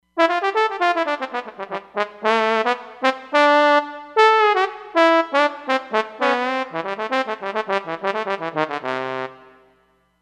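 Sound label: Solo Trombone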